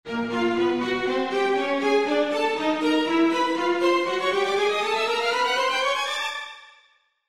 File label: Strings Ascend, Strings Ascend Accelerando; Cartoon; Musical; Cartoons; Blastwave